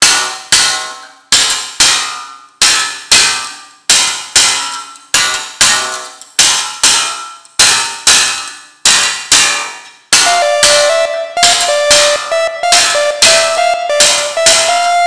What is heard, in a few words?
Do You Want A Bass Guiter